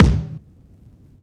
Vinyl Kick; drum; CYCdh VinylK2

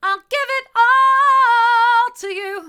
hard, vocal

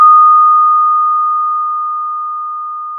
pop, Sound of a school bell

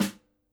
Snr, drum

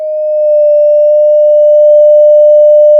Sound of a police car siren

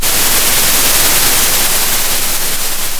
pop, Sound of a thunderstorm

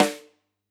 close Rim, drum

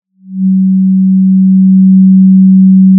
Sound of a cruise ship horn

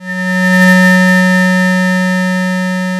pop, Sound of a cruise ship horn

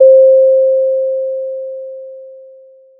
pop, Sound of a doorbell

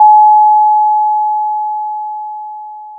Sound of a doorbell

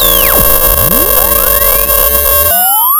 pop, sound of a bike bell

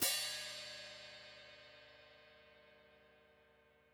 close Pd Hat, drum

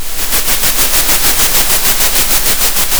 pop, Sound of a train

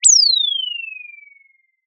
Sound of an owl

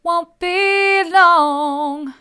wont be long mid, vocal

